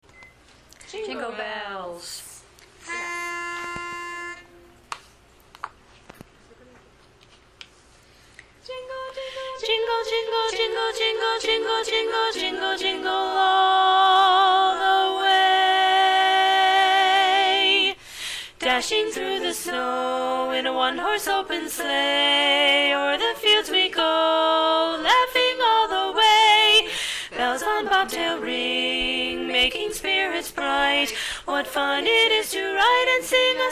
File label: Jingle Bells, LEAD